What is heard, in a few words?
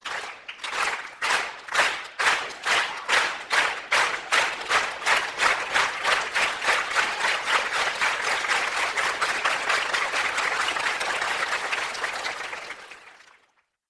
applause